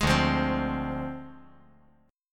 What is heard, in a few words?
D Minor 7th Flat 5th